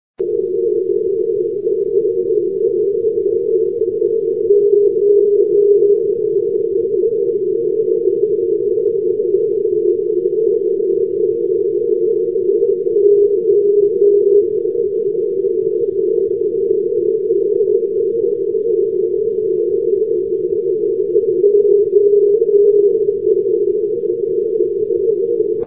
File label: ndb seinajoki; maakeskidx; europe